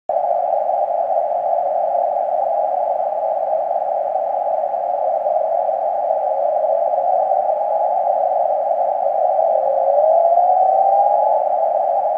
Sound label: New Band Mini Whip